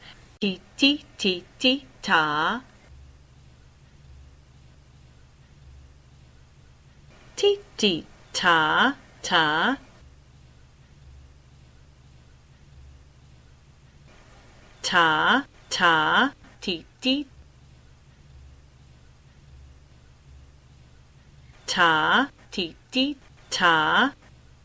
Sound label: rhythms voice